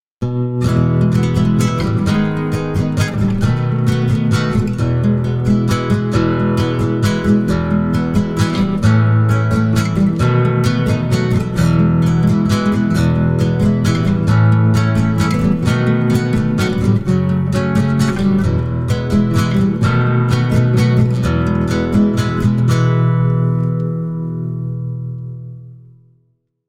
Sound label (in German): kerzenlied instrumental; morgenkreis